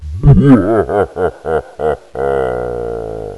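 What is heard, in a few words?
evil laugh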